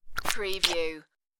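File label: Throat Slit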